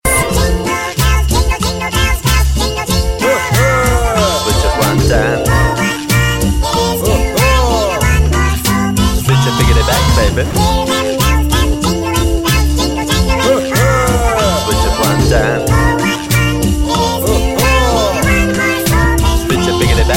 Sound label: Jingle Bell Cute